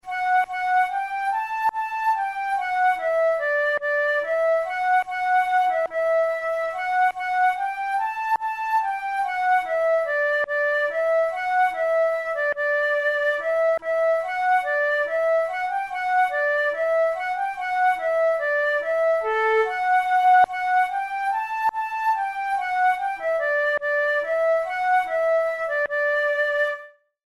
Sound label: flute